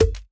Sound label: Bounce Rubber